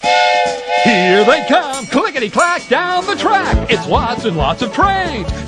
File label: clickity clack